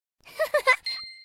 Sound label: upgrade celebration